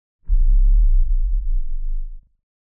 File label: Giant Floor Panel Assemble Final Lift LFE; Boomtown; Sideways Town; Titanfall 2